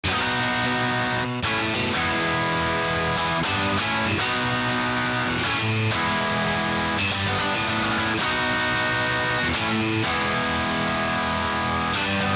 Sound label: NOISY RHYTHM, Roland SC-880; Factory Patches